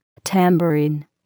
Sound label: tambourine